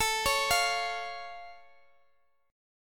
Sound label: A Augmented A down; A+ arpeggiated